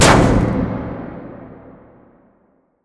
stomp sheet metal, antlion step

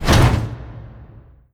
entry hop down, dog; npc; scripted

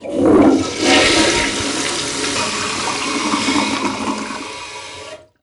toilet flush occluded; scripted sequences

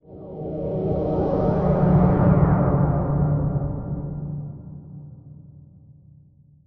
ambient abstract deep whoosh; Half Life Model Pack

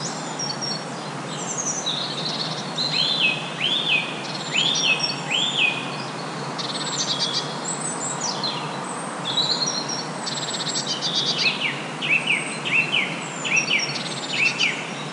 bird bed, ambient animal

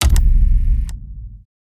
main menu press watch; ui; Counter Strike Model Pack; CS:GO; panorama

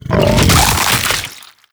bite attack, creatures, barnacle; Half Life Alyx

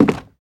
wood, footsteps

